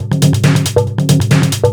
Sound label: drum, 138, drum-loops, tribal, Techno; Techno ID; tribal-tune